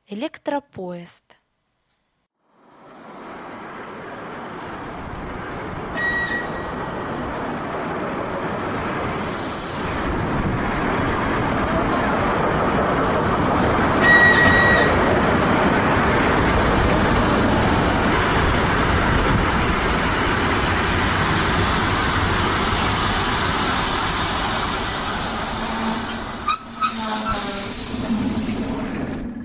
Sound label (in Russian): Электропоезд